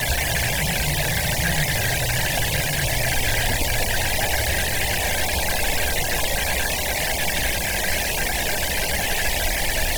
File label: waterfall hydro; titan